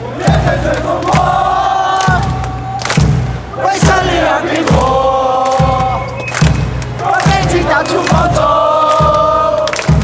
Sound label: allez allez allez, chants; bian fu